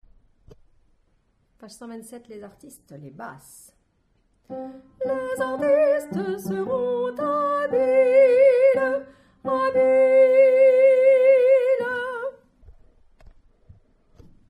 Basse

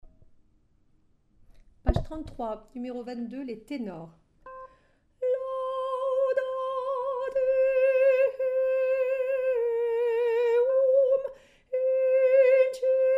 psaume Tenor

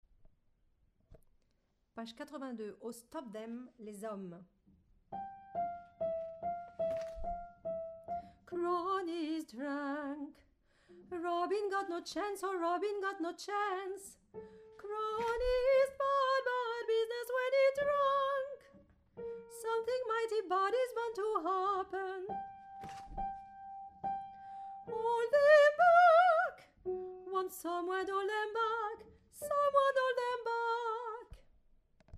Hommes

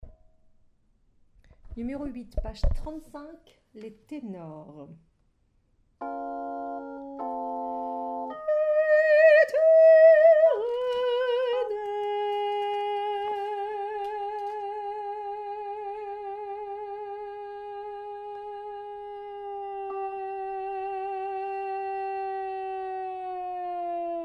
Tenor; pop

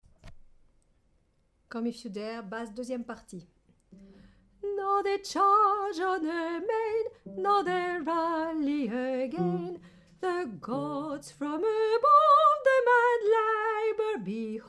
Basse

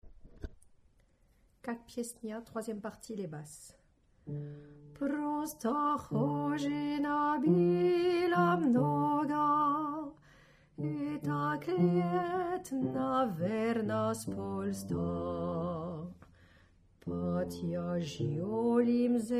Basse

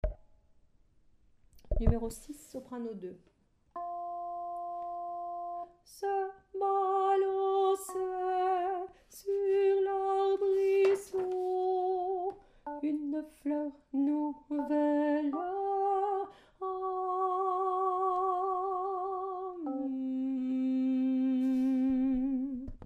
Soprano; pop

